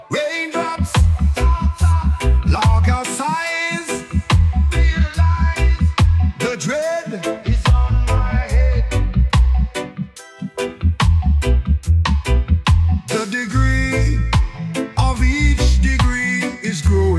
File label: Raindrops Reggae